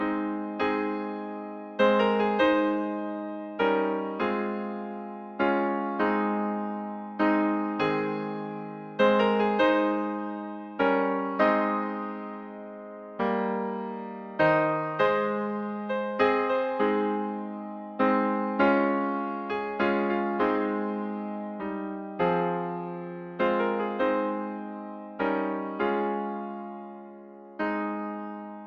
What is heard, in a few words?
amazing grace, alto sax quartet